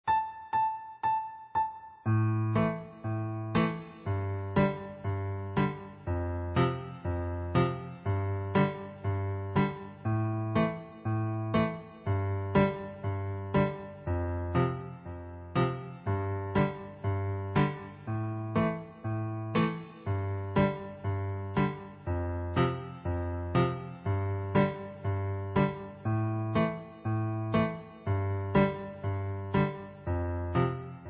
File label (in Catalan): impro per nens la sol fa rapid, Flauta; improvisa; Flauta 6è